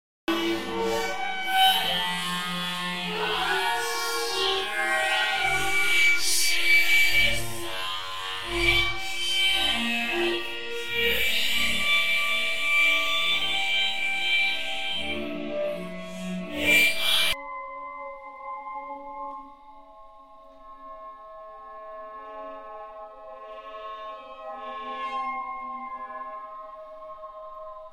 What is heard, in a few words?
many saxophones